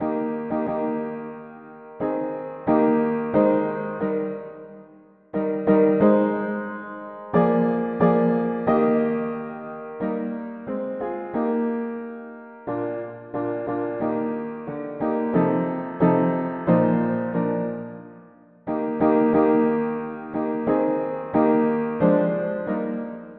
mono piano; hymns

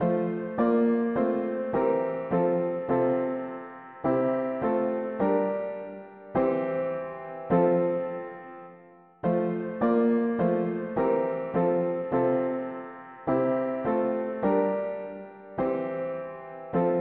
mono piano; hymns

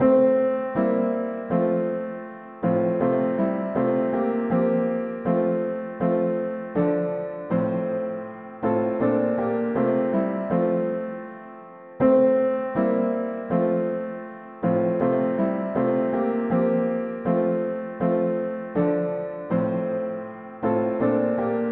mono piano, hymns; In the Lord we have Redemption

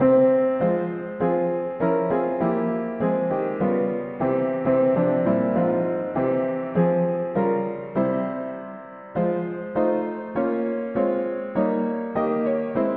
mono piano; hymns

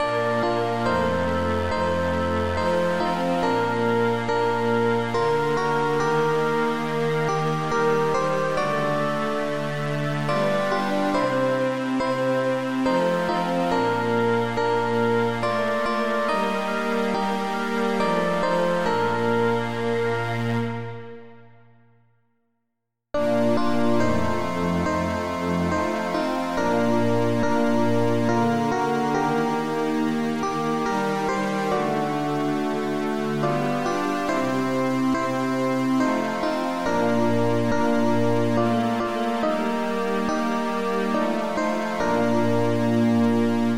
Jesus calls us over the tumult polyinstru; hymns

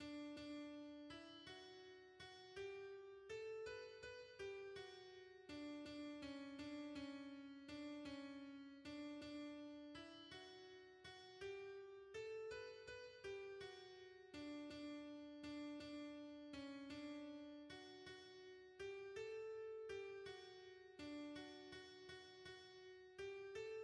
alto, hymns; Louange a Toi Dieu notre Pere

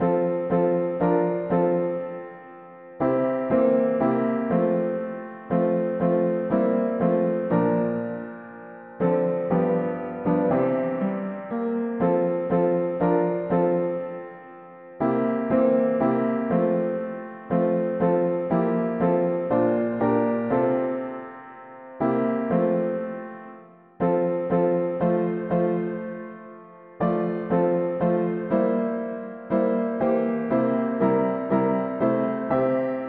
mono-piano; O Dieu notre ame est confondue; hymns

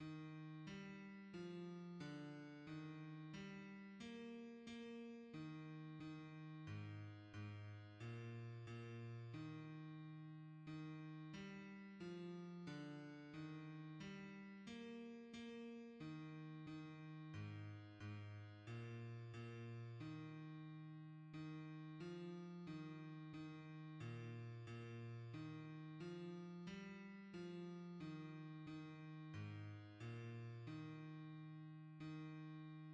bass; hymns; May the grace of Christ our Saviour

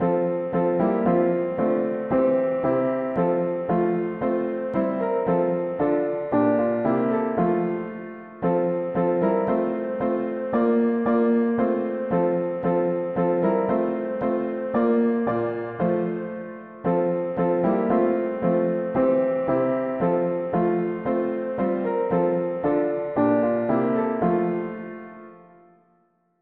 mono piano; hymns; Unto Him Who Loves Us

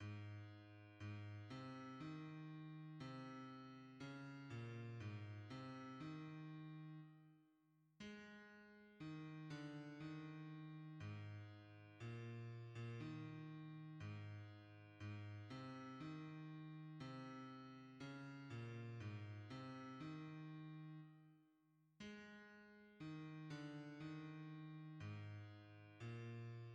bass; A l Agneau revient toute gloire; hymns

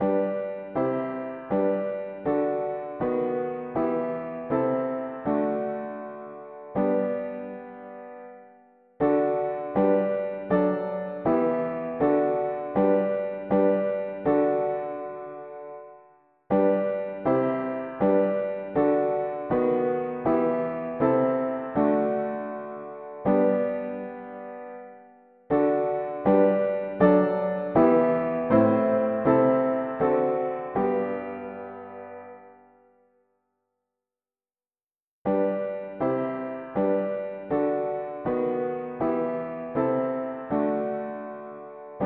mono-piano; Seigneur nous contemplons ta gloire; hymns

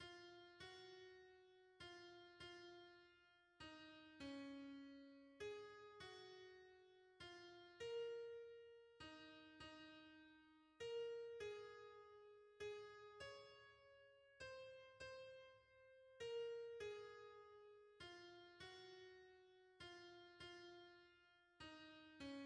hymns, soprano

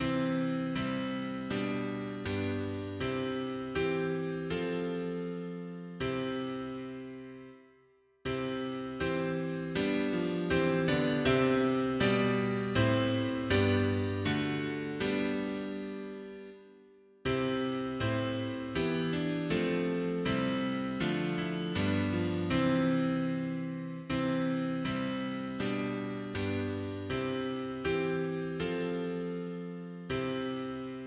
mono piano, hymns; Jesus notre delivrance

